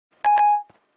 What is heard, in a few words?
notification